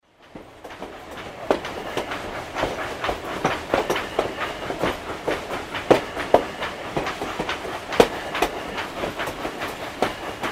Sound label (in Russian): stuk koles po zheleznoj doroge